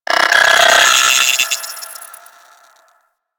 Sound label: Space Drone B Attack C; Warframe; Corpus Space Drone